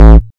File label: Bass A, Offbeat Bass; VEC1; Club